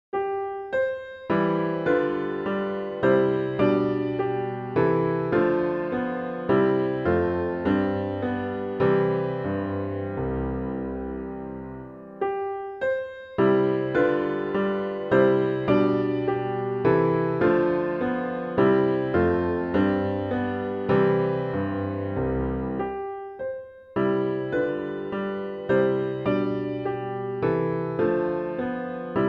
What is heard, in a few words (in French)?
menuet bourgeois gentilhomme